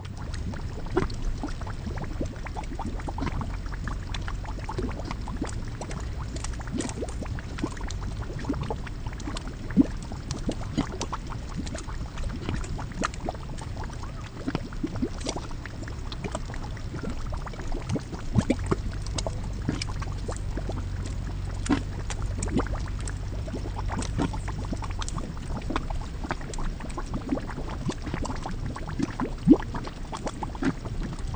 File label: Liquid